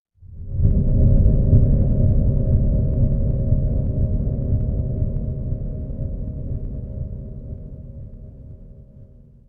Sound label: horror drone